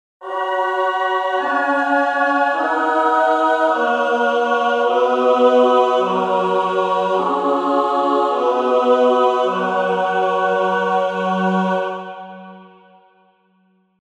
17 prog choir, 17-ET

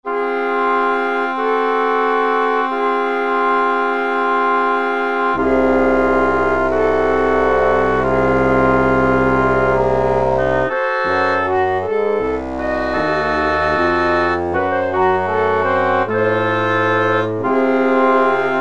pump1 woodwinds no verb; pump tunings

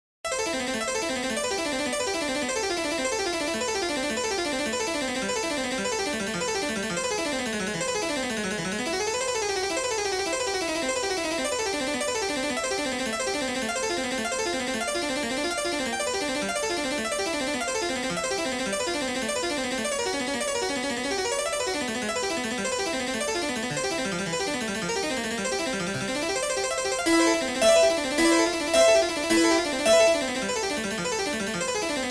Harpsi; Shostakovich; Prelude Fugue A

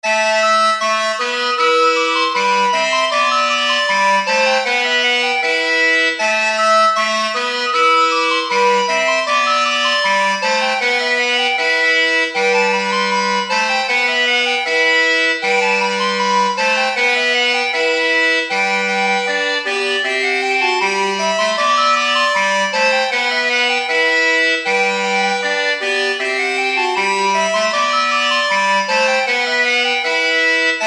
staines morris, shawm, quarter comma meantone

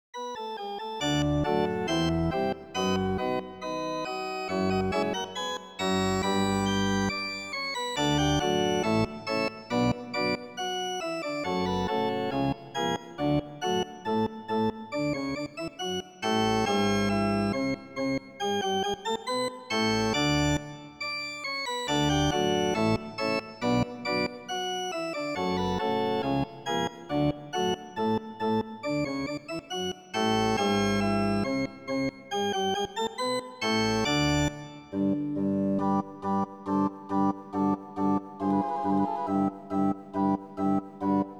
Gavotte Organ Pipe; Marvell Woo; Prokofiev

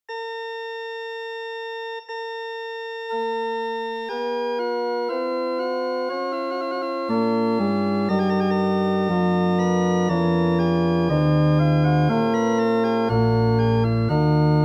bwv 614 werckmeister iii

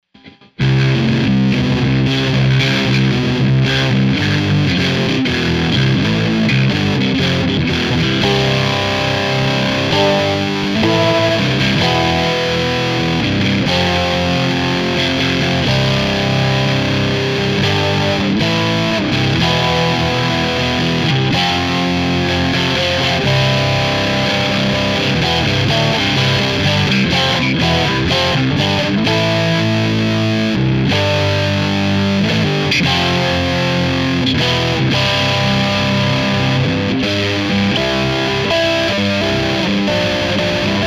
JI ties metal; temporary frets

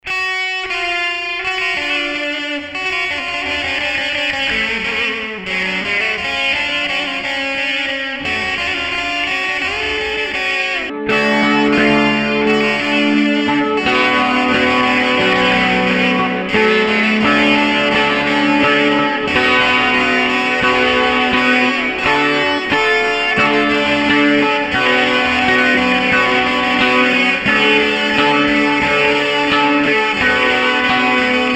charming forks; 11-8; 19-ET

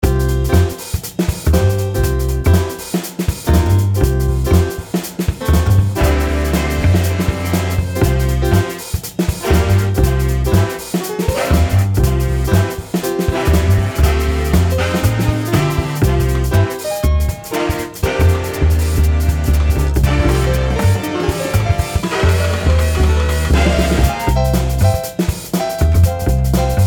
17 et Jazz

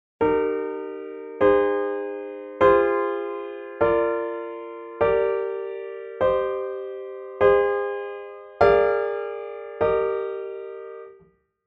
17 cadences, 17-ET

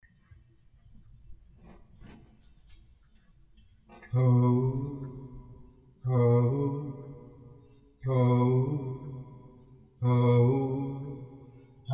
voice, adaptive JI

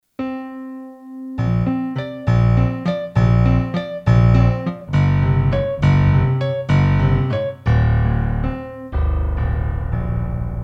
algorithmic composition subharmonics 1-37; tuning survey

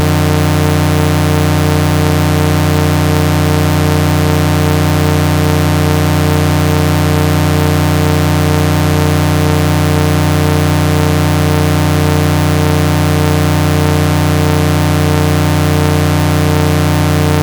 Major Sixth

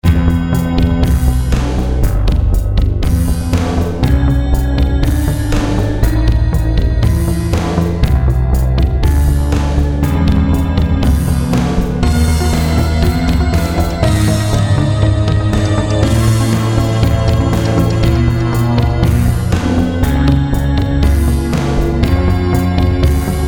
mini piano study in 22 electro

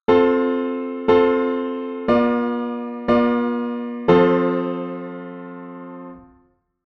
plagal cadence IV 16; 16 edo theory; 16-ET

